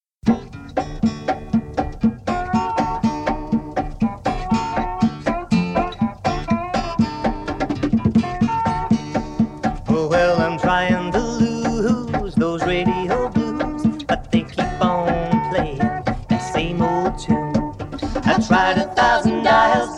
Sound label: Radio Blues